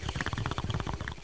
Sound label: hits